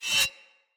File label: Saw Dagger Whoosh Accent Heavy; Weapons; Player; Swords